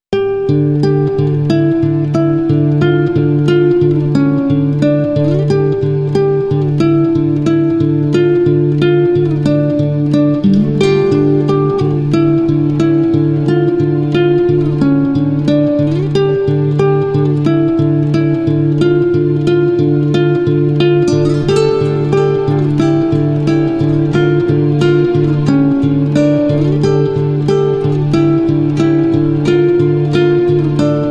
Guitars and Strings Only

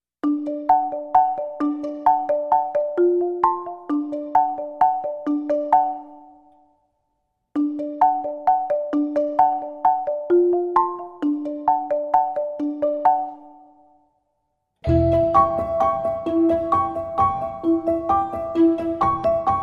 No Perc

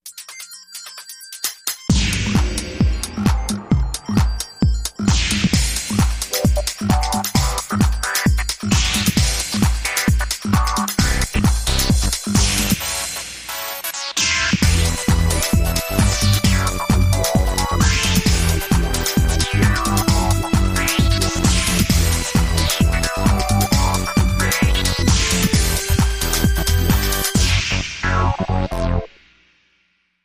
Techno Unlimited

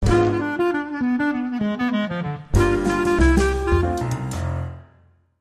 Swinging Jingle

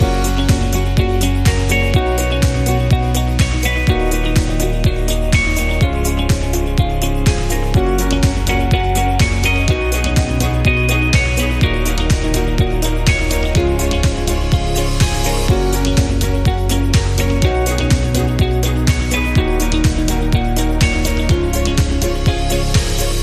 Positive Change

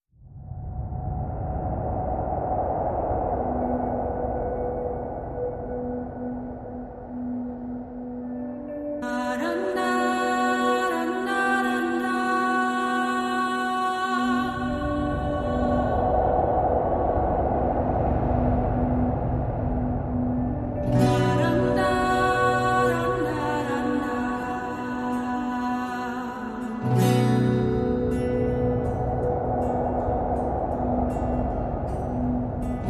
No Perc

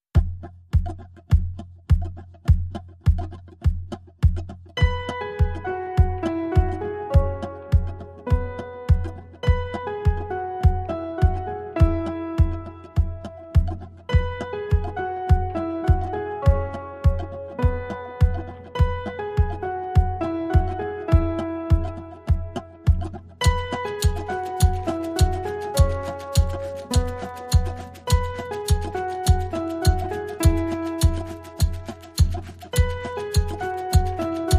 No Acoustic Guitars Reduced Arrangement

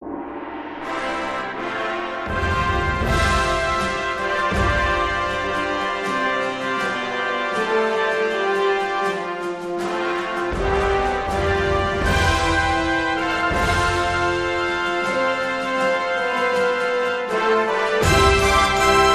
Triumphant Fanfare

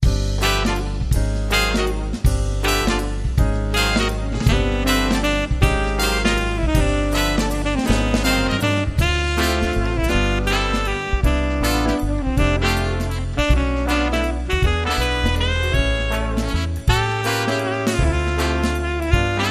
Jazz Brass

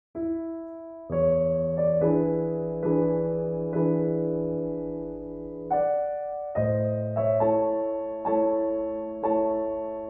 Prelude In A Major Op 28 No 7; Frederic Chopin (PD)

